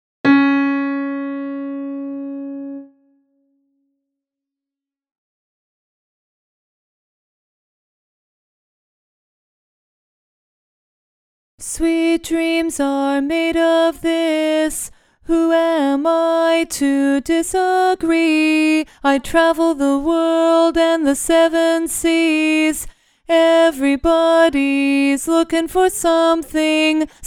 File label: lead alone